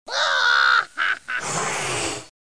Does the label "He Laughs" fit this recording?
Yes